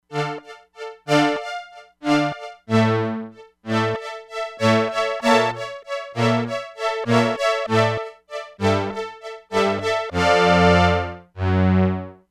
VELOCITY CONTROLLED STRINGS, Crumar Bit 99; Factory Programs

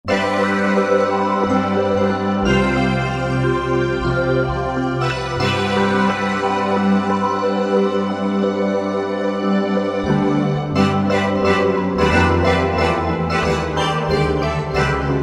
Sleigh, Korg TR-Rack; Lapponian Sleigh

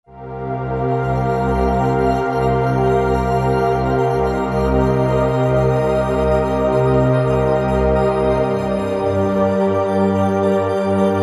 Warm Shimmer, vintage synth; Roland SR-JV80-04

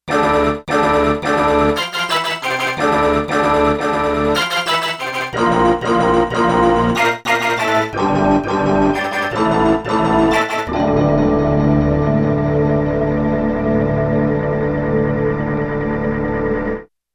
Gated FX

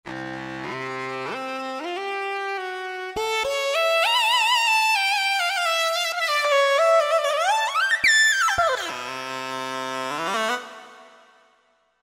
Scratch Lead, Roland V-Synth; Factory Patches

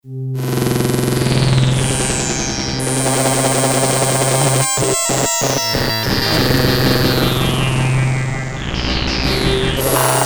Electrocution, Prophet-5; Sequential Circuits Prophet-5 (Ver.3); Factory Patch; File 2 Programs

